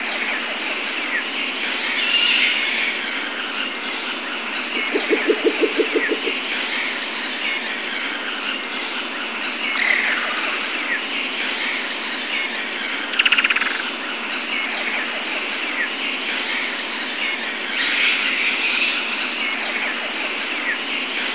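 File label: jungle ambience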